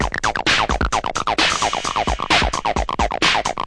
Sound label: acidline with 909 set; SYNTH AND ELECTRONIC LOOPS